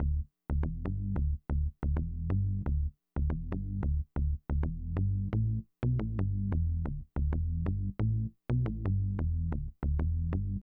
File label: Bass; Hip Hop Philosophy; big fish audio; Apple Loops; 090 Emin